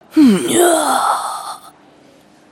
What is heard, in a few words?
ninja groan; avant gardens